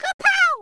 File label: Kapow